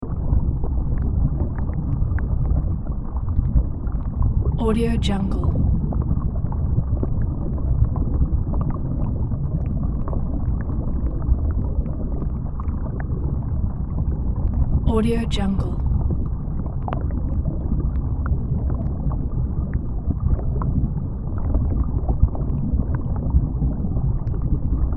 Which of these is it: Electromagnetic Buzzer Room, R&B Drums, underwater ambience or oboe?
underwater ambience